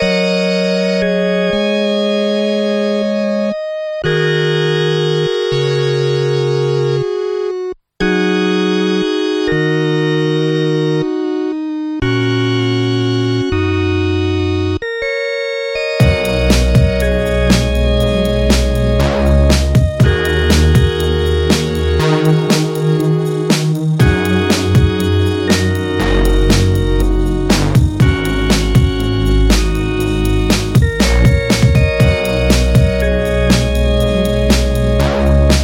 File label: microfreak; arturia